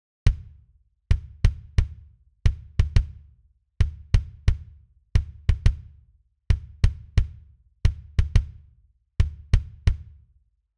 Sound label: Kick Off